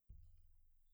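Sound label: bass drum, drums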